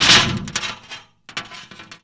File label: DIGGIN MA; Rarefaction; Poke in The Ear With A Sharp Stick